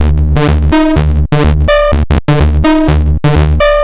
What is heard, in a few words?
Jackin Bass; Sonic Foundry (Sony Creative Software); Underground UK House & Electro